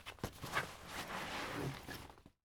Rough Handling Rustle Backpack

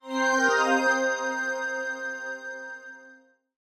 Dreamy Musical Sfx

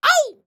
Cartoon Thin Vocal Fight Voice

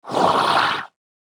8 bit Monster Slithering

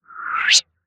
Swish

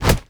Kick Body Impact

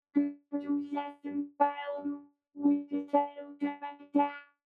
Choked Nose Robot Gibberish

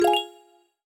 Quiz and Puzzle Musical

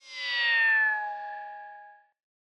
Comic Portamento Down

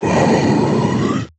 8 bit Monster Deep Voice Growl

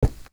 Boots Concrete

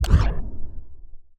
UI Sweep Transition Quicker

